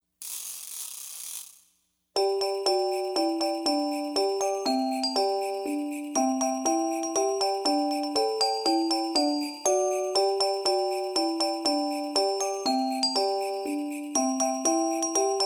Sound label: Jingle Bells, Traditional